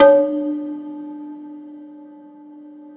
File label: Rubbery Piano, prepared piano; Total Piano